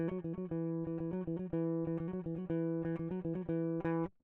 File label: electric